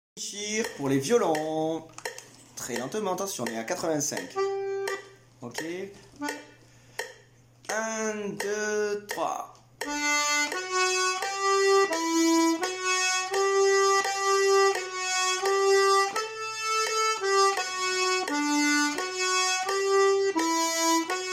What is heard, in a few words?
inisheer violon 2; Musique d-ensemble